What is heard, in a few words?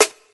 juicy acoustic snare sound g sharp